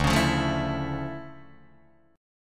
D Minor Major 9th D down Guitar Open D

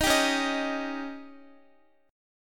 Db Suspended 2nd Flat 5th, Guitar; strummed; JGuitar

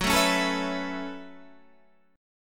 F sharp Minor 11th

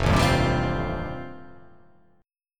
F 9th F, chord

